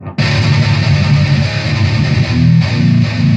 METAL

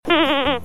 Sweep

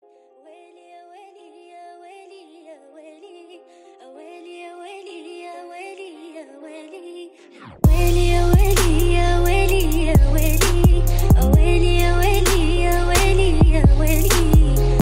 Trap Remix

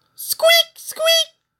Squeaky boy

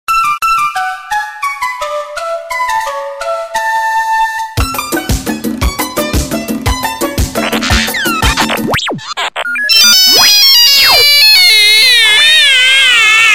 Nokia Crazy Mix

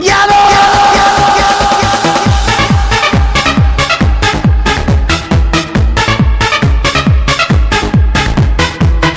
Manieczki Tone

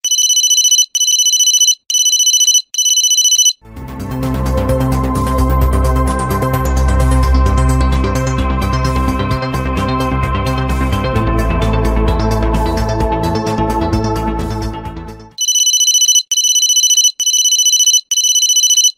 Alarm Clock Htc

